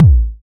puffy kick sample a sharp key, bass drum sample tuned to the A# note; kick drum samples